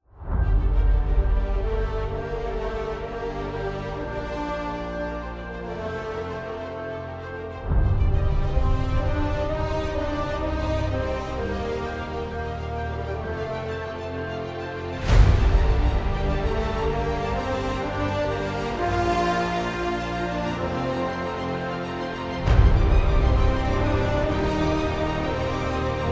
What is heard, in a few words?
Epic bare basics with atmo boom ostinato string stacc